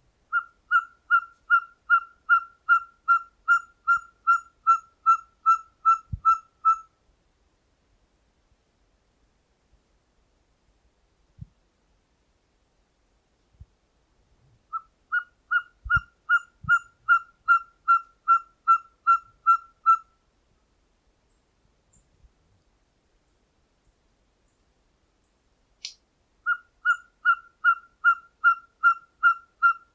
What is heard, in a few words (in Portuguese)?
Canto da Coruja Cabure